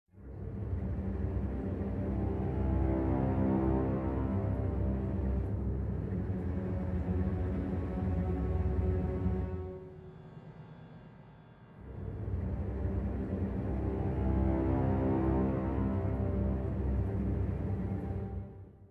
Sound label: Cut Music Citadel Loop